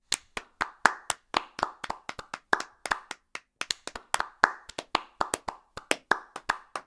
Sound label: clap tiny